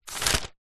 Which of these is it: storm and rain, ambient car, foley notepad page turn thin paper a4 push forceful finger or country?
foley notepad page turn thin paper a4 push forceful finger